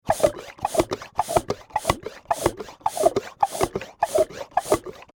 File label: Household Pesticide Pump Slow Wet; Yard Tools (Sound Effects:Tools)